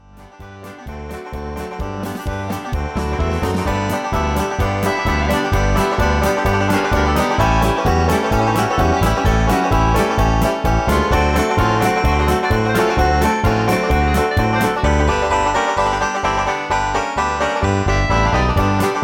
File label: Banjo Pickers Ball Instrumental